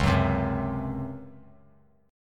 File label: Db Major 7th Suspended 4th Db down; strummed; JGuitar